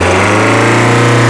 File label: alfa romeo second